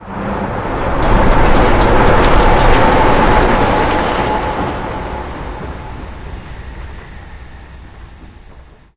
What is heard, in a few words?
metro train passby, warehouse; Source Engine; tf; mvm warehouse